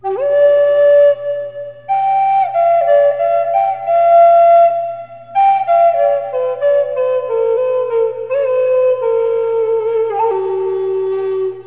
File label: flute